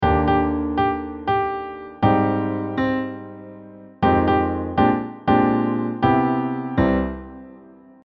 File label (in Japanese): スケールで考えるメロディー